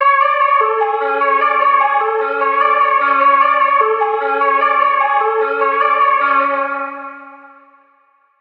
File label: Cm 150bpm; Minister